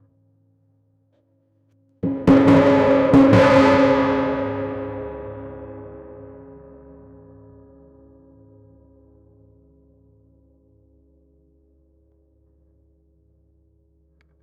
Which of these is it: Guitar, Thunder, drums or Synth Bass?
drums